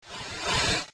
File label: Air Slash; Pokemon Reborn